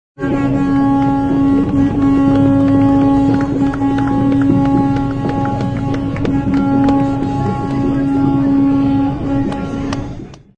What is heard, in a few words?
Fire Truck Horn, Horn blasts from old school fire truck; Classic Fire Truck Horn; Trucks; Vehicles